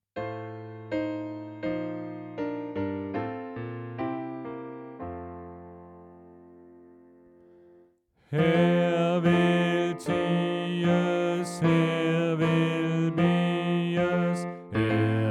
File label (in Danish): Bas